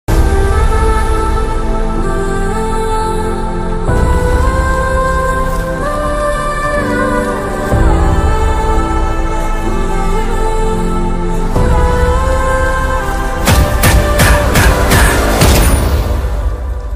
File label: Emotional Bgm; kabzaa; upendra; kichcha sudeepa; shriya saran